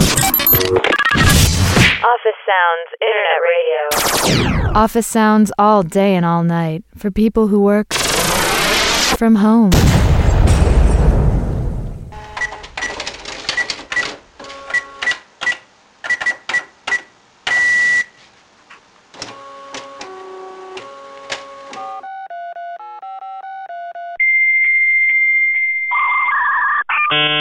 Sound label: Office Sounds; You Don't Hear Jack 2; Office Sounds Radio #1